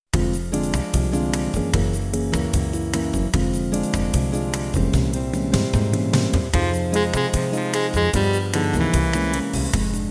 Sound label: Jazz